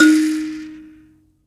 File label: PLK BUZZ, Buzz Kalimba, Africa